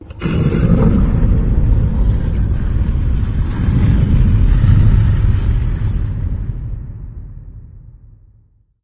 amb muffled lo mach

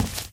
walk tile, footsteps

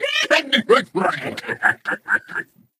ballbot laugh

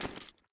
taunt equipment jingle; video games; source engine; tf

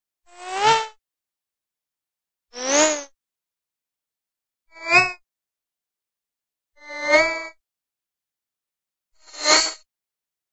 sci fi space capsule fast pass by; cartoon

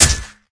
axe hit flesh, weapons

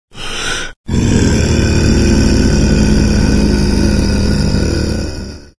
dbl chant, vort

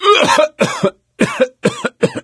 cough; voice; source engine; left 4 dead 2; survivor; gambler

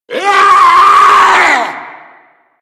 smoker warn, voice; source engine; left 4 dead 2

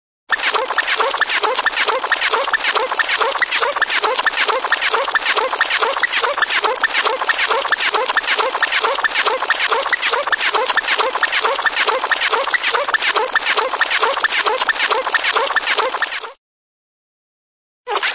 helicopter, funny copter, steady; cartoon

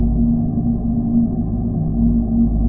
abstract air, tones; source engine; portal2; ambient

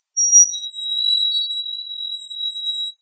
equipment stress; ambient; Source Engine; cstrike

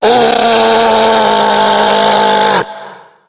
hulk yell, tank voice yell; Left 4 Dead; Source Engine

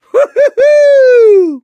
positive noise, mechanic, survivor, voice

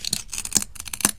glass strain; physics; Source Engine; cstrike

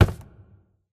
phys cube, physics

